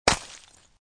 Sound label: Brake; Angry Duck Cannon 1.5